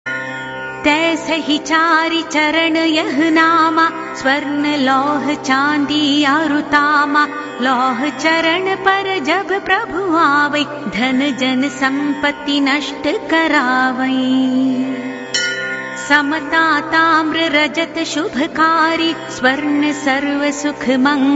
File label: shani chalisa; deities